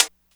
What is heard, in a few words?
Damped Foot Hi Hat Sound D Key, hat sound sample tuned to the D note; hits one shots; hat samples